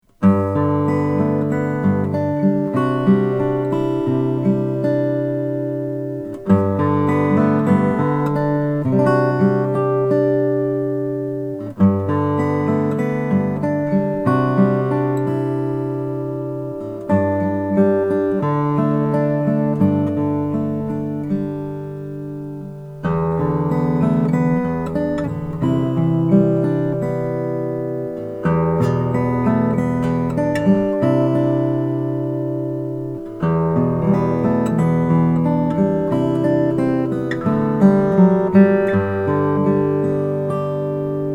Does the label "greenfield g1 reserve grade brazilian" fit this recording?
Yes